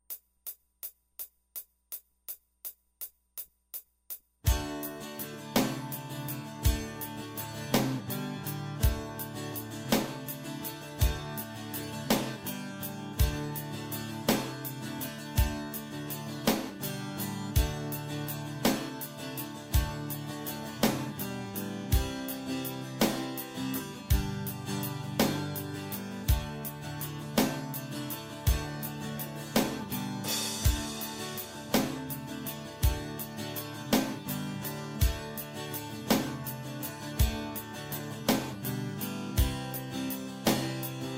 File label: drum gtr arr; Programming Drums On The BR-1600CD